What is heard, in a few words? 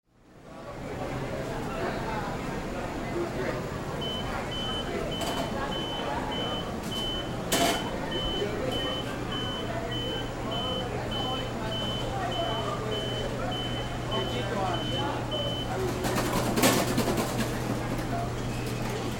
Ambience Restaurant Kitchen; Restaurants